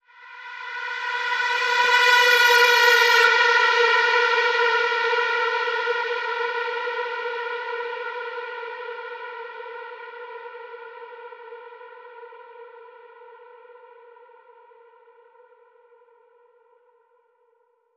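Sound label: Bend; Spooky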